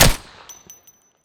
deagle silence; EMXArms; kund50

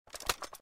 rpk put away; emx draftsman

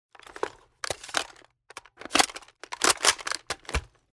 reload empty; EMXArms; mg90

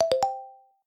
call join

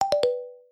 call leave

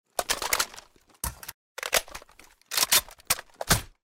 rpk reload empty; emx helios

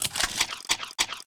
reload; EMXArms; pmg90

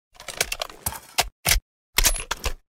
m4a1 reload empty; emx rivet

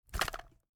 inspect lower; emx atlas

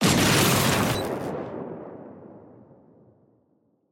shoot, arms; EMXArms; pmg90

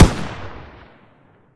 ak47 shoot; emx helios

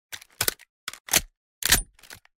deagle reload empty; emx kund50

